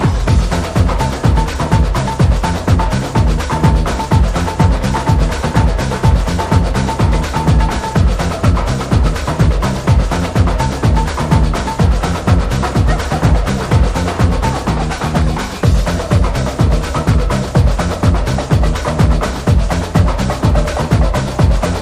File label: BRAZILIAN BEAT